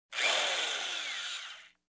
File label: Electric Hand Drill Single Rev